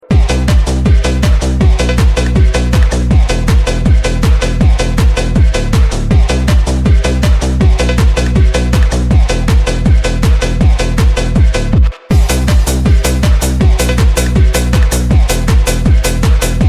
Phonky; ringtone funk; آهنگ زنگ فانک

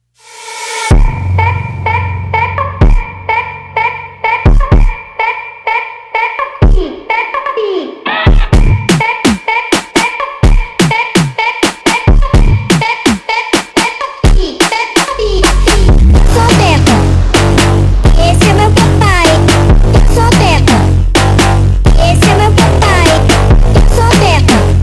phonk Version

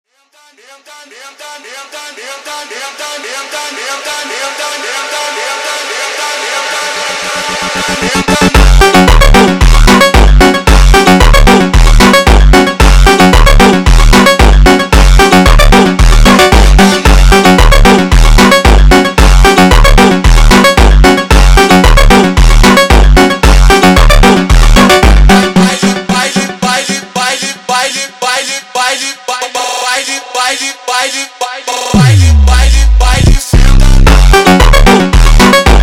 MONTAGEM Slowed

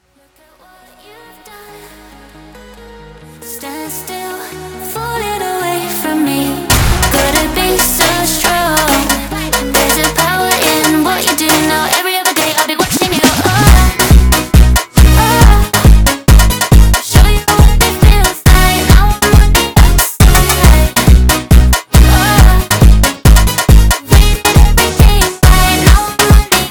FUNK Sped

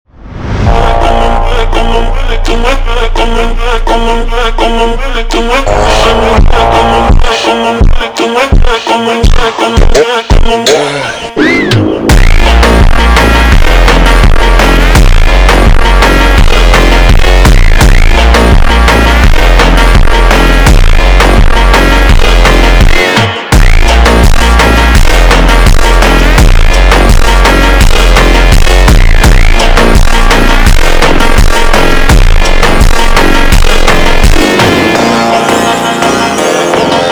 Funk ultra Slowed

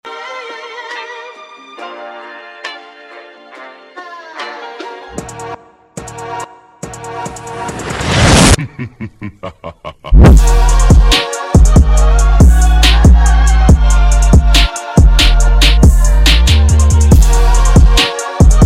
Phonk; ringtone

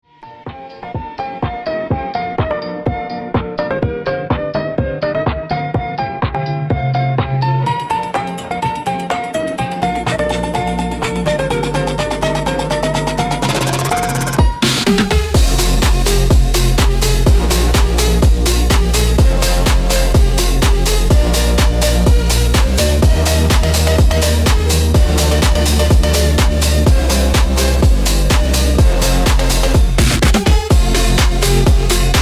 Phonk Remix; Bubble Bobble; ringtone

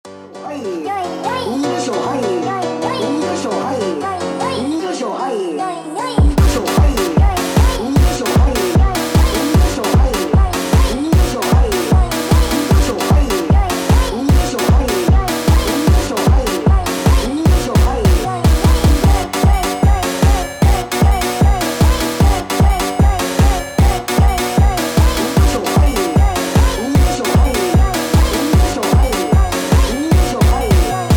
sped Up Reverb

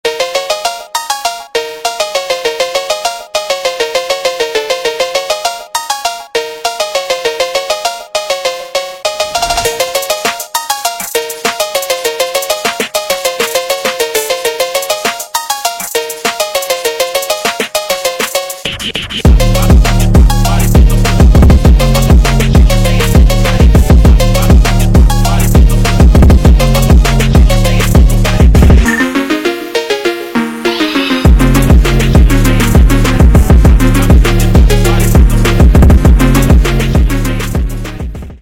Phonk; ringtone funk; آهنگ زنگ فانک

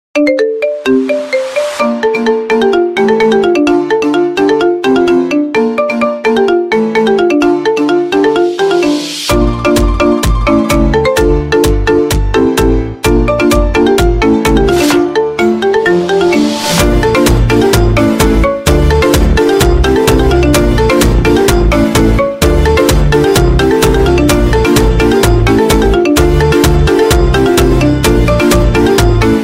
Chinese Phonk Echoing Marimba Remix; ringtone

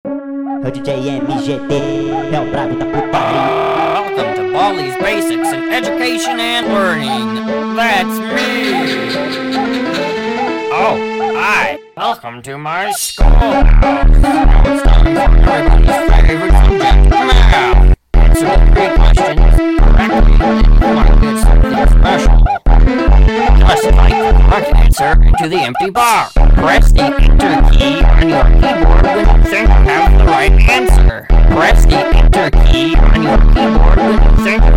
Funk sped Up

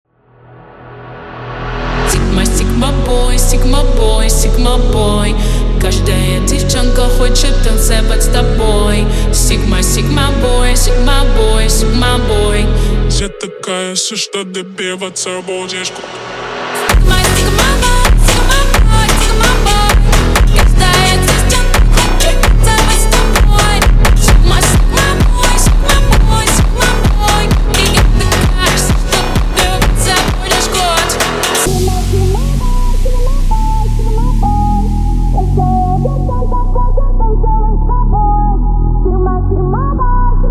phonk

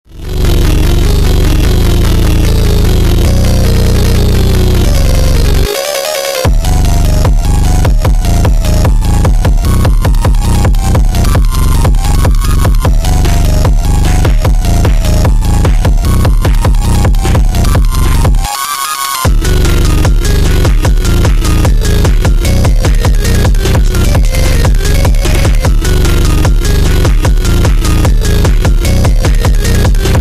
Phonk slowed, Drift Phonk

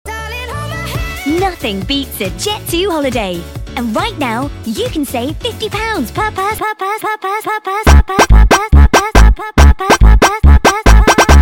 Funk sped Up

